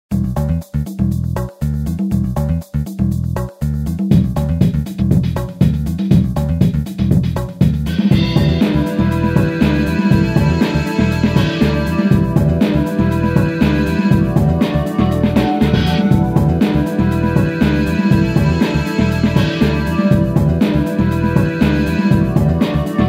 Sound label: beat